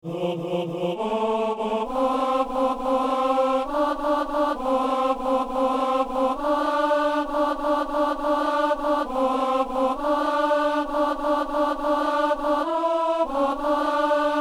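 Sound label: tenor; Messe coutrillonne - Alleluia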